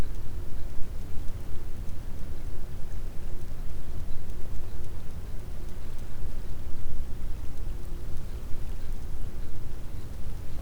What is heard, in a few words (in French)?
cachalot positif, Physeter Macrocephalus